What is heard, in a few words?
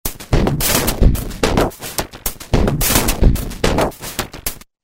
Shredded Loop, Roland V-Synth; Factory Patches